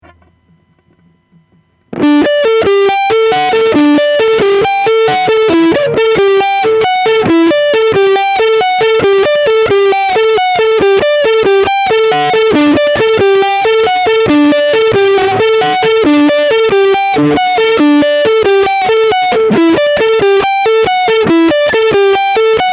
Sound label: Lead